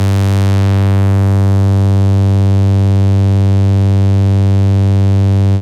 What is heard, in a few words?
Dist Saw G2, Moog Voyager; Moog Voyager Sounds; VES1 Dist Saw